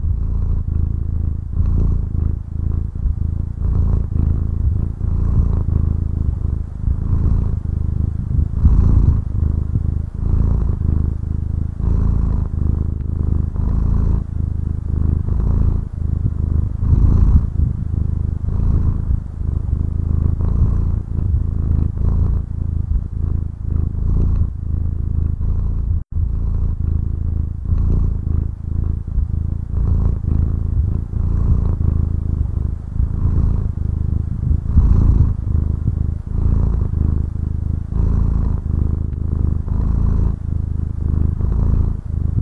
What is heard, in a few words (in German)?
schnurren